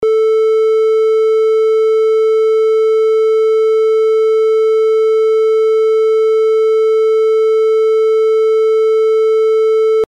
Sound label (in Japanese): トーン 三角形